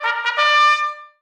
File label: bugle